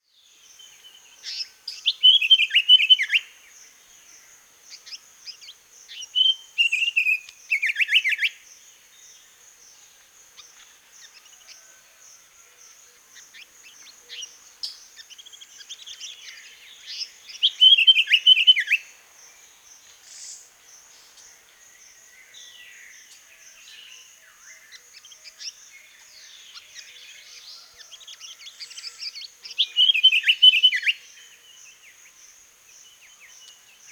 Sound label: African Paradise Flycatcher; eBird